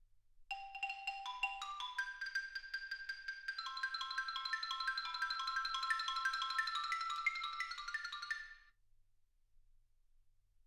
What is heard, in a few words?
xylophone